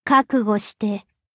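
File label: attack; hina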